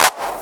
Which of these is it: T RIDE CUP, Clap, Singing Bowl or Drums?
Clap